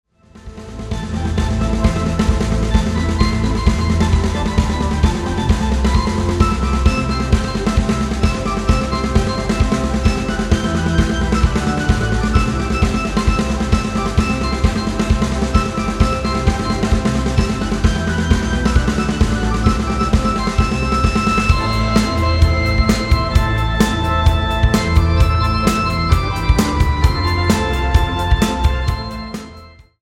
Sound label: drum org